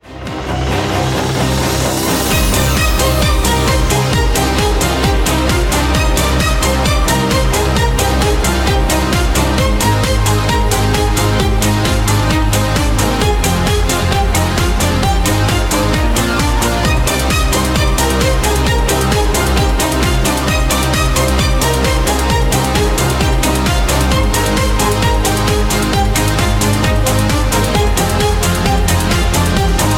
instrumental

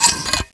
flame out, weapons

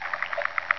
leech alert

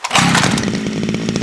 chainsaw draw; weapons

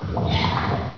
ichy idle

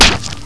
bullchicken spit hit

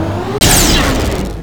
displacer shoot, weapons

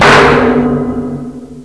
tentacle strike

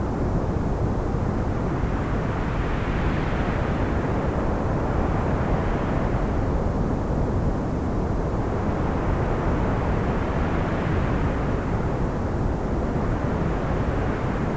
wind

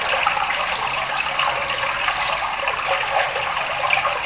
lab water, ambience

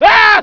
scream, scientist

